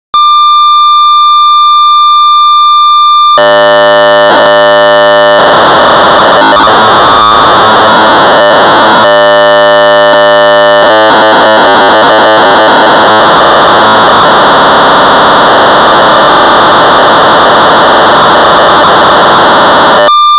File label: Sound Effects Generator; Req ZYTEK Analogue Interface and Joysticks